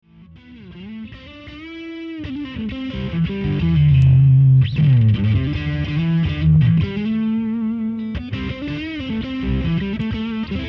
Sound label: sidekick drive; stomp